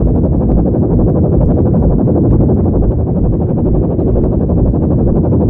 engine rotor loop, combine gunship; npc; Garry's Mod; hl2

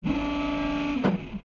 Mech Servo Long; Sid Meier's Civilization 4 Complete; Beyond the Sword; Units

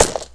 hit ground; Ace of Spades

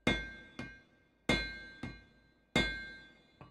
blacksmith; Mount&Blade Warband

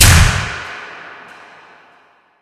arena lights on; ambient, atmosphere

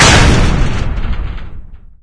heavy metal stop, doors; Garry's Mod; hl2

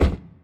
doors arrive; Counter-Strike Global Offensive; de bank

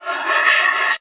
move; guild maker; Emperor - Battle for Dune

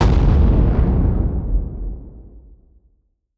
heavy armour fire; Hearts of Iron IV Death or Dishonor

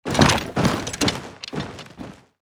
move order army; Hearts of Iron IV Death or Dishonor

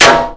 metal box impact bullet; physics; Garry's Mod; hl2

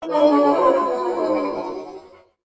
Mech Death Vox; Sid Meier's Civilization 4 Complete; Beyond the Sword; Units

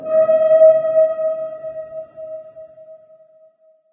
metal; Garry's Mod; hl2

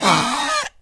gas gasp, smoker, voice, idle; Left 4 Dead 2

